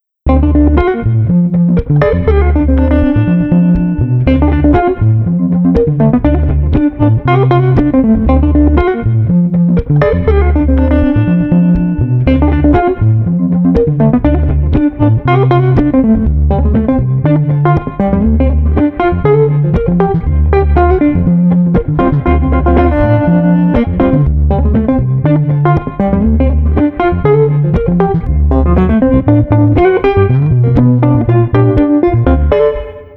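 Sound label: Street Guitar Bass Groovin, 120 bpm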